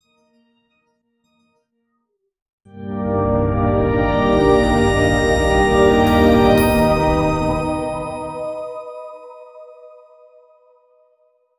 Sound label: light airy synths or tona; instrumental